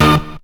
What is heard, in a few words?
HIT, STAB; SoundFactory; SMPMANIA